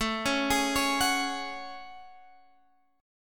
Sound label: A Major 13th, Guitar, Drop D; chord